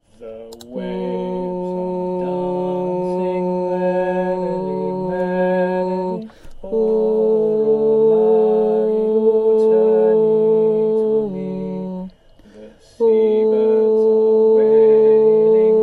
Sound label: sop with bass